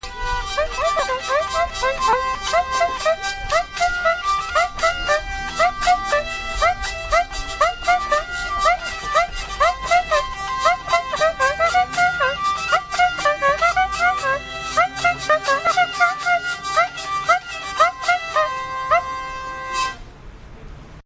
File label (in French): vielle et cuica; St Ceret 2006